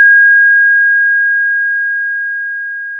Sound of a school bell

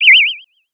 Sound of a bird tweeting nicely

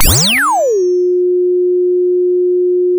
sound of a bike bell

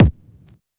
Vinyl Kick, drum

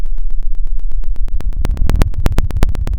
pop, Sound of a fire crackling

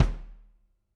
Loose Kick

